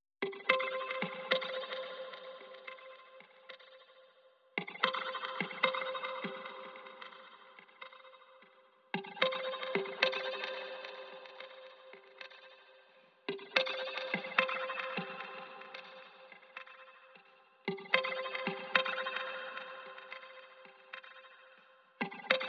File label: Guitars